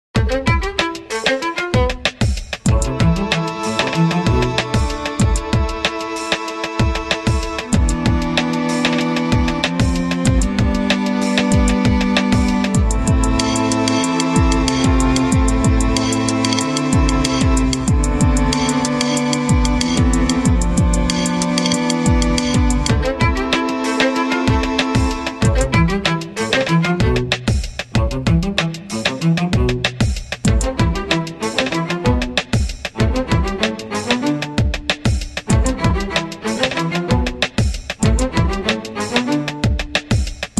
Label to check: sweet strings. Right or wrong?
Right